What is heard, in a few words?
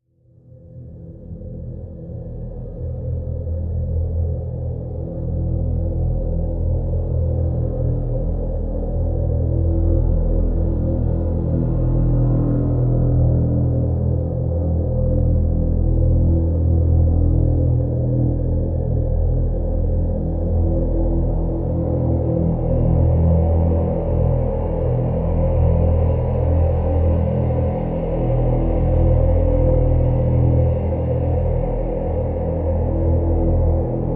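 Drone Gauntlet E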